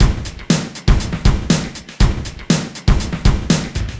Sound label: Acoustic Drumloop